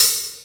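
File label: Room open hihat, Roland MC-303 Cymbals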